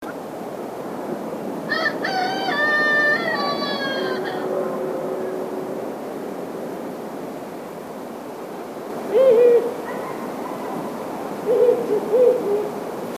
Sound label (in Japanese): hukurou